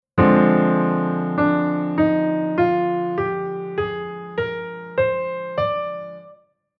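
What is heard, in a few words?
Chord Scale Minor Natural Minor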